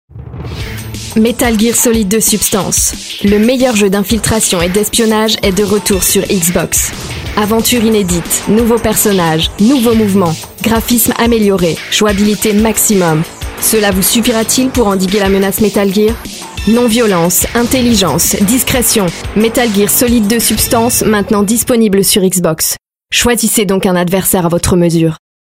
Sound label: metal gears